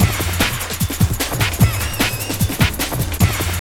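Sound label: drum loop; X-Static Goldmine 3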